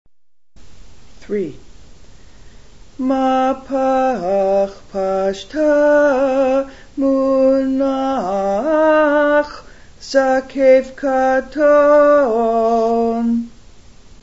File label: mapach pashtach munach katon, Haftarah Trope